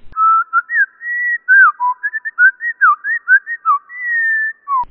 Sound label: bird